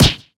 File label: combo hit; HeavenStudioPlus; karateman